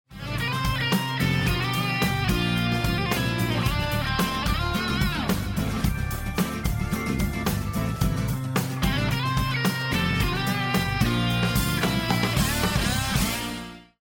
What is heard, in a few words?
lost round; music kits custom